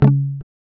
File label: GUI C#, Acoustic, Roland